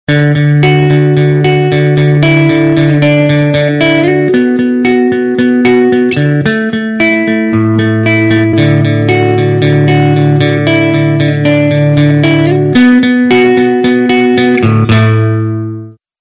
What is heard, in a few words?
sms guitar ringtone